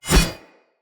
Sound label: Curved Sword Whoosh Left Right; Weapons; Player; Swords; Sinecure